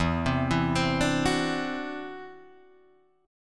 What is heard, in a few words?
E 7th Suspended 2nd Sharp 5th, E, down, Guitar, Drop D